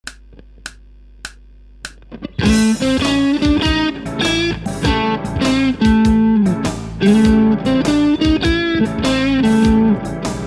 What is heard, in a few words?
Blues Etude